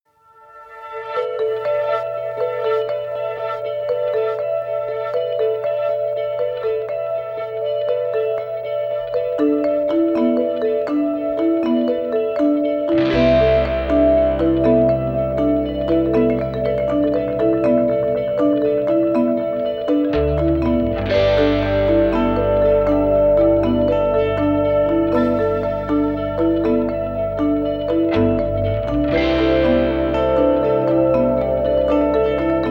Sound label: Ambient